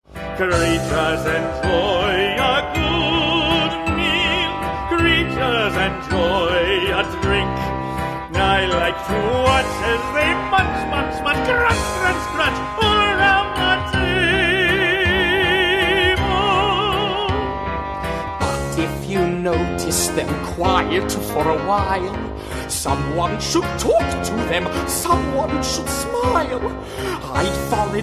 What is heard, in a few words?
Waltz